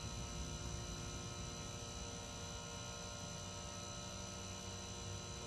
Backrooms Ambience

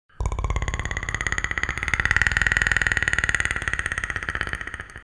predator sound effects

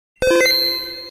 save game sound effect; pokemon red blue yellow

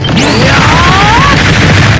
magic burst

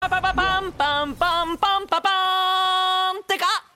ffxv victory

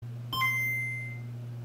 original gameboy startup sound

